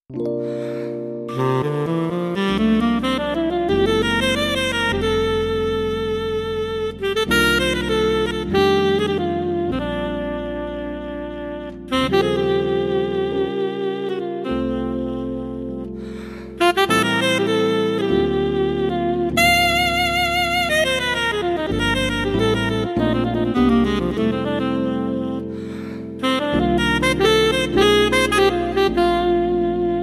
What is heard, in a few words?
Super Articulation Tenor Sax